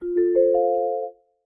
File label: announcement 4-tone up quickest